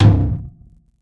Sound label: FLOOR TOM UNDERWATER, ELECTRO KIT